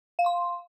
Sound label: alert